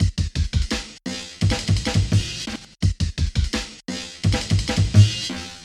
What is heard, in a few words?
Amen Break E 170 Bpm